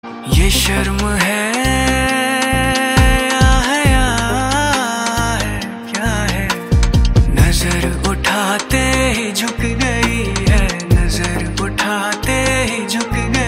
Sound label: Ringtone